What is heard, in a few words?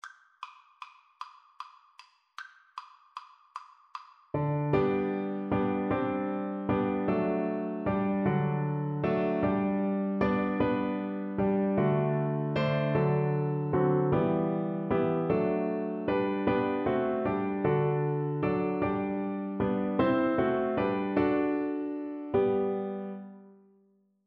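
viola karaoke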